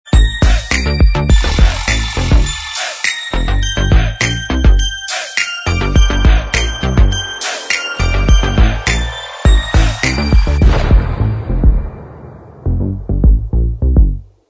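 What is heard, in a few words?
instrumental track, backing tracks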